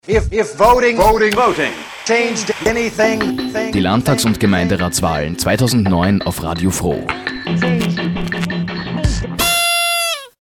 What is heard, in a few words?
jingle